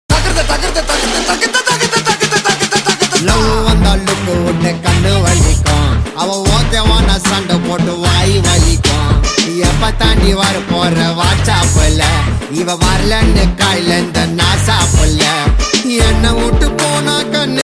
song love tamil; gethu; amy jackson udhayanidhi stalin

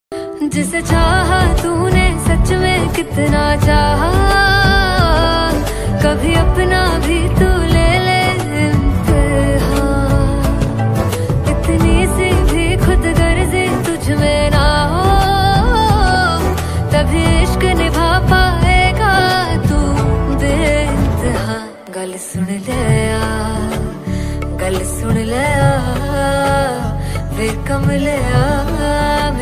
hindi ringtone, female version

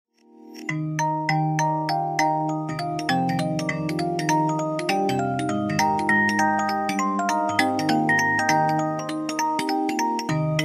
hello motorola stock ringtone

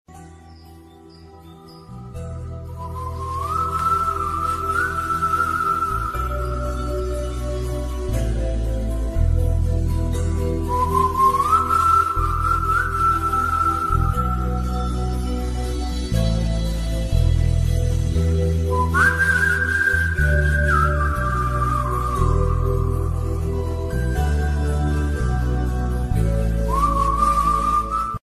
tamil songs ringtones tamil songs bgm; vaaranam aayiram

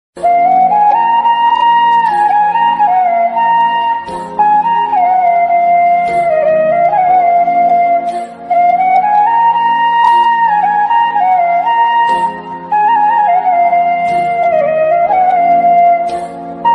tamil ringtone south indian ringtone song bgm

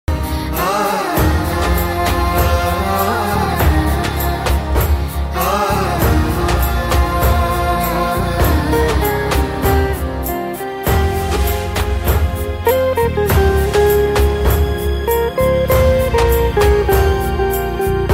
tamil sad bgm ringtone love song ringtone

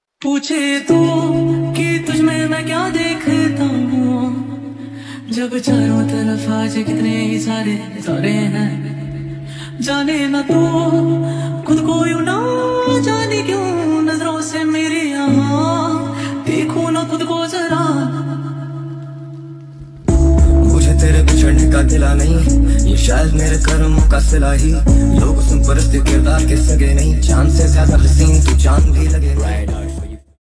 ringtone trending song

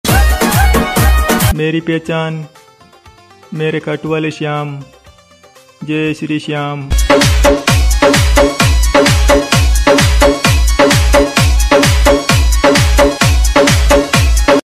shyam ringtone; khatu shyam

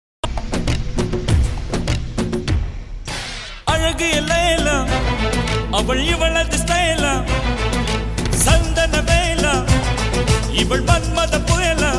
song

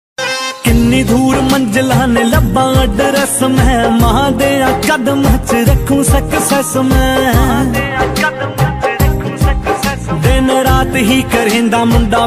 song ringtone

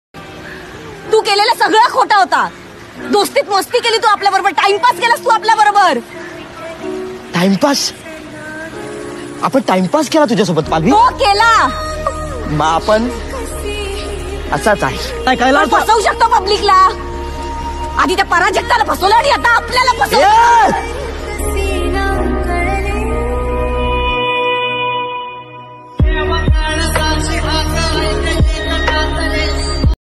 sad marathi

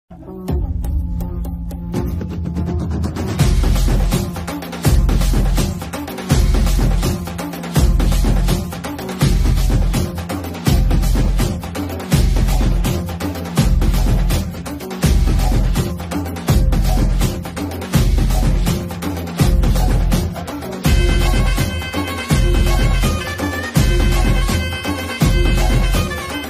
song cover bgm ringtone; kanguva movie

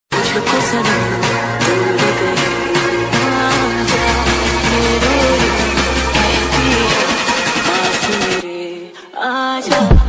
PSY Trance Ringtone

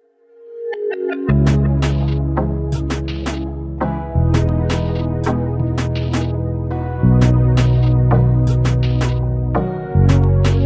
panjabi ringtone

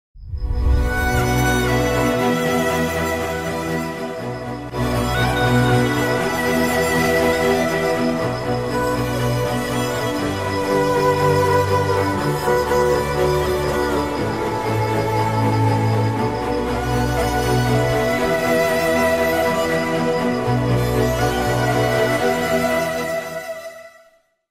sad violin bgm; Deiva Thirumagal

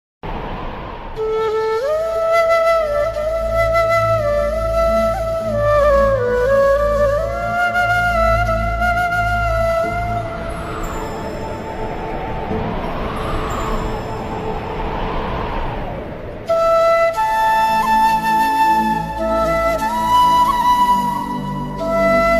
flute ringtone best flute sound; radha krishna serial

